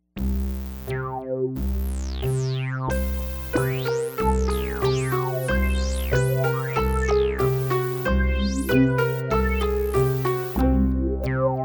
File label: Instrumental Suite